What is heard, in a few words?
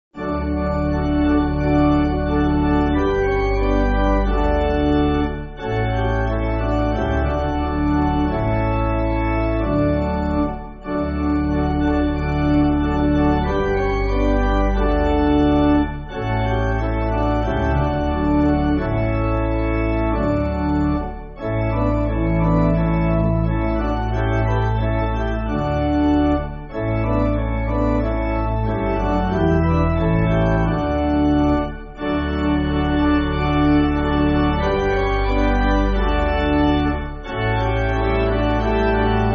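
Pipe Organ, Free Music Backing Files for Churches; Nobody Will Reach; Nilsen Lund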